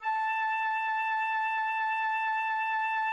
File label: flute A5